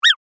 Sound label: mouse squeak